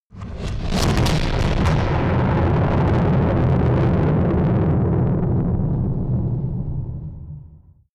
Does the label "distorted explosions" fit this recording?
Yes